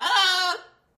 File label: Parrot Talks